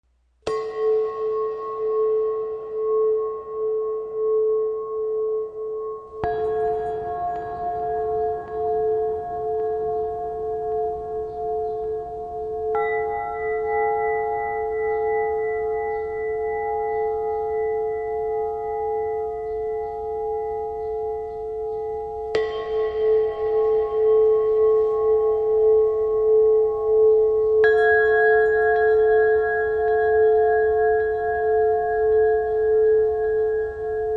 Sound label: burmese, gong